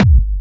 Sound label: Medium, kicks